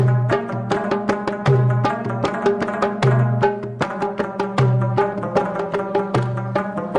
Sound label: afghan musik; alternative